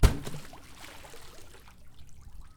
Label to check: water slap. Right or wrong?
Right